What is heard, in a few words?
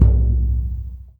LOUD DRM, BURUNDI; Spectrasonics; Heart of Africa